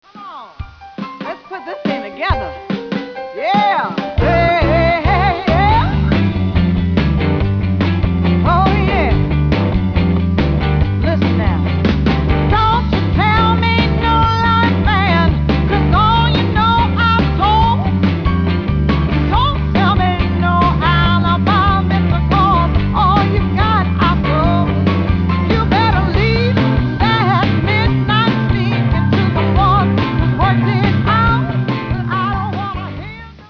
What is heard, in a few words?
rock Roll